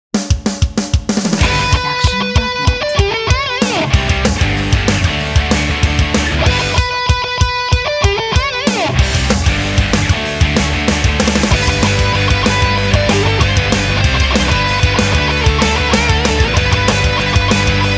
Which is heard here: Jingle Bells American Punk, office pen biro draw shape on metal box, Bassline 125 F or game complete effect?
Jingle Bells American Punk